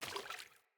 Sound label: Sandals Walk Water, footsteps; Player